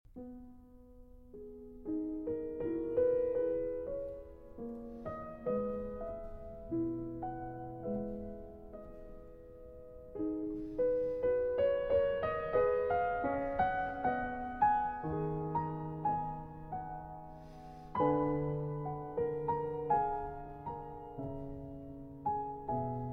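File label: Variations on a Polish Folk Theme, Piano Works; Szymanowski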